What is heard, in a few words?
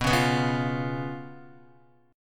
B Suspended 2nd Flat 5th B down Guitar; strummed; JGuitar